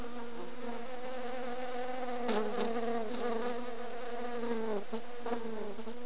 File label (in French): mouche